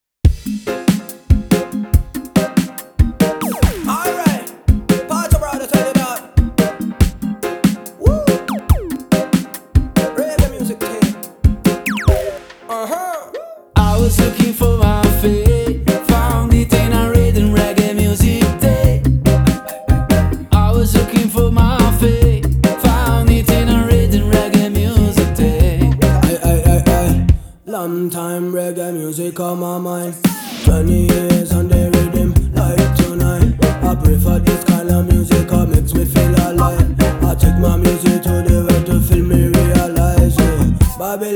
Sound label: Reggae Music; Tape